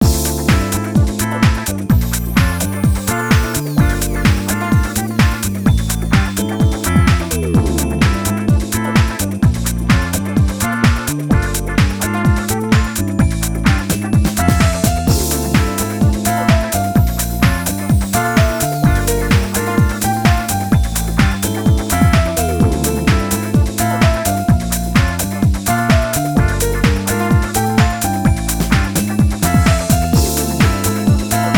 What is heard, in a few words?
Instrumental